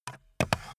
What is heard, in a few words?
stapler pickup